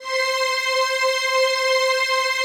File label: PAD, Pads; Best Service; XX Large